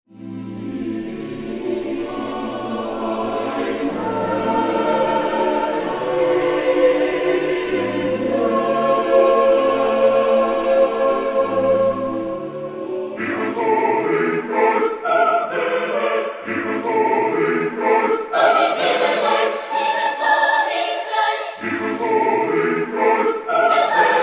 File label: classical; JamPackVoices